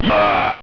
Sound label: ugh; Midway Games; Smash TV